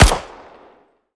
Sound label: bizon; arccw go